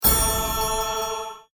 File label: reward Carrot; inlogic-bunnyquest